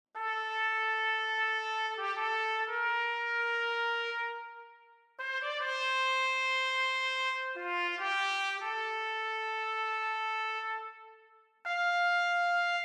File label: Solo Trumpet Modern Mix; Nucleus